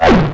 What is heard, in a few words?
pit drone communicate; svencoop; sectore